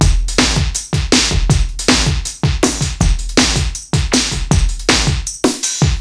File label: SF Electronic Drum